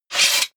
blade sheathe large

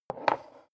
glass put down tipping slow

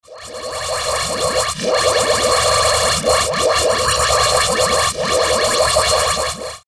finished lazer

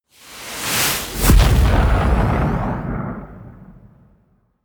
missile medium fire stationary close

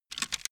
bamboo pick up

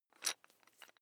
electric drill bit fasten wrench turn very fast

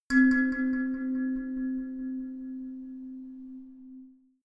melodic odd tine bell low

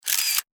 35mm camera shot

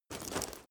leather metal walk fast single

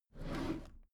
packed drawer smooth open regular halfway

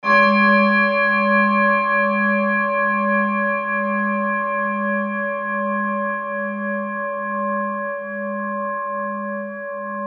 japan rin bowl beated

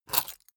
key ring large grab table hard

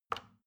plastic button tiny release regular

